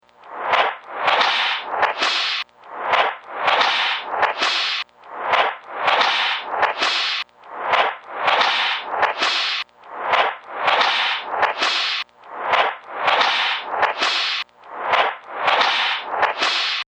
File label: Torture Machine Loop, scary soundscape; Mr Whippy The Torture Machine; Fantasy, Horror And Sci-Fi Games